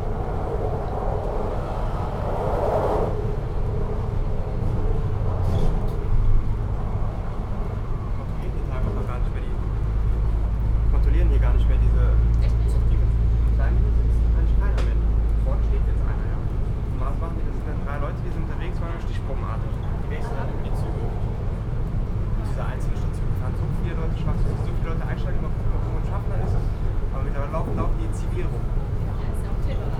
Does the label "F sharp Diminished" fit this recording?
No